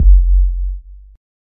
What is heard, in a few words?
long tail reverb kickdrum F sharp key